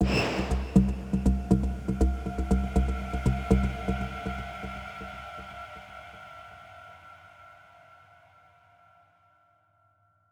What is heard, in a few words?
Day Amb Stinger, gamerip; dying light